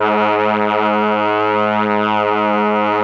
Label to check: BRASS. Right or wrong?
Right